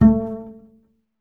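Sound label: string contrabass A; Miroslav Vitous; Solo Instruments VOL-2; Solo 2 Bank 1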